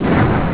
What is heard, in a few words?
bomb